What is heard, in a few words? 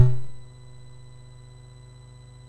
sid MOS6581R2, GAMES; Face Off